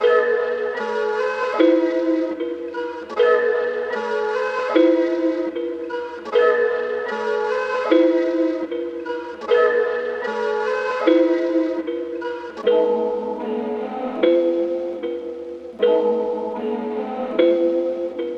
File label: 76 BPM G# Min, Melody, Trap; Cymatics; 2022 Melody Collection; Trap Pt 1